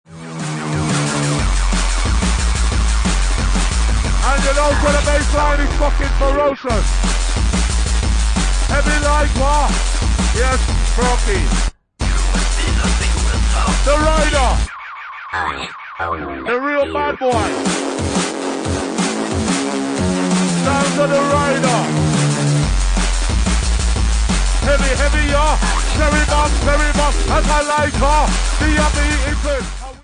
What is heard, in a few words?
DNB